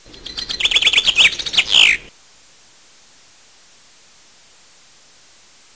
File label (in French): pinson